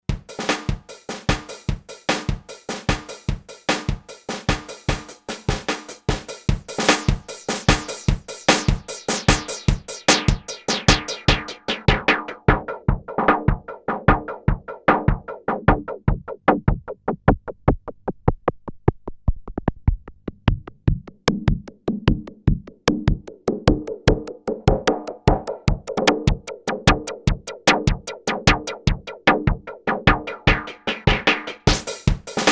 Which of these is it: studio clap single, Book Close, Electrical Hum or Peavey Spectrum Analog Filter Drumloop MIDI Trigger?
Peavey Spectrum Analog Filter Drumloop MIDI Trigger